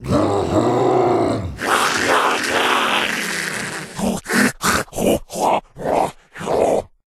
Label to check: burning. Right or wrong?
Right